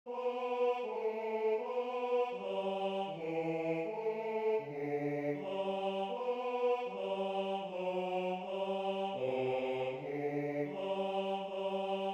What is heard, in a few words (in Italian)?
Tenore; La natività (Gauntlett); Natale